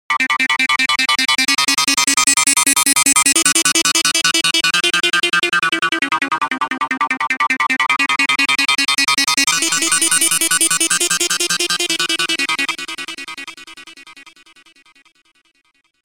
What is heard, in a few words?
Goa Trance Arp